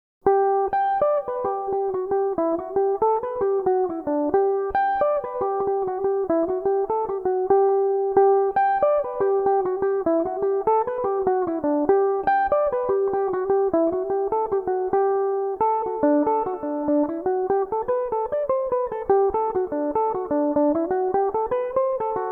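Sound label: quickstep, G major, mandolin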